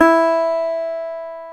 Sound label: GTR, Dobros, National